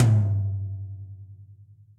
Tom Low BigPunchy; Drum Hits; Ableton; Factory Packs; Session Drums Club; MPE Hits

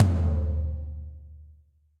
Tom Floor DarkPlateVerb; Drum Hits; Ableton; Factory Packs; Session Drums Club; MPE Hits

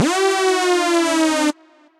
Vice Descend, Effects

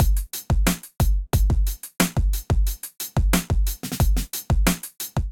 Kit groove 90 bpm; Apollo Kit groove 01 90 bpm; Ableton; Factory Packs; Drum Essentials

